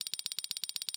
XP On Level Up

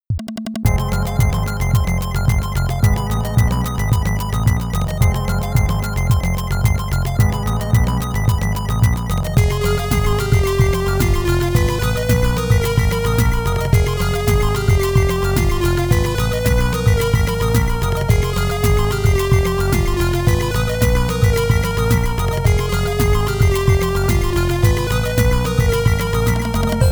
Heavy Vibe; miho pc 9801

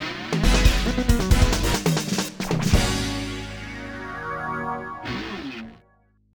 Jingle of Goal; Alpine Surfer; Namco System Super 22; arcade

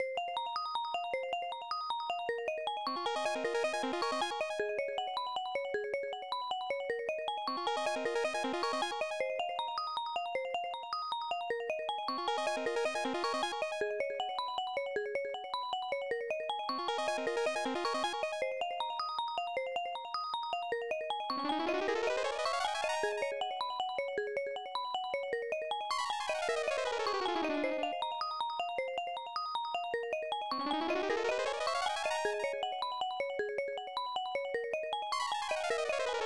Time Warp; doraemon 3 nobita to toki no hougyoku snes

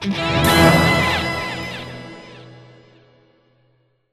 Level up; Spider-Man Unlimited; Android

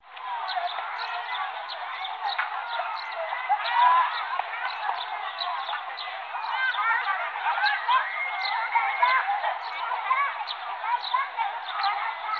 Ambient BGM

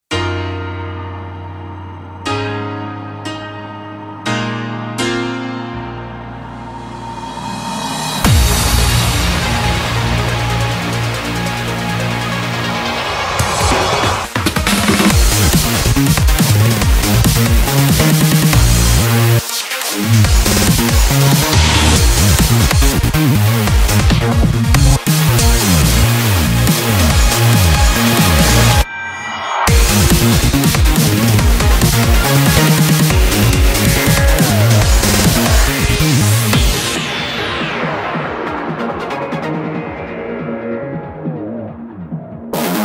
Piano Intro; Tekken Tag Tournament 2

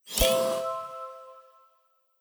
mini monologue accept

